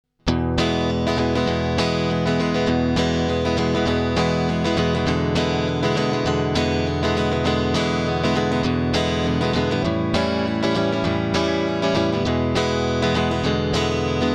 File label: blues prog